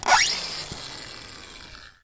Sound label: screw squeak; Weird and Interesting Hits; single hits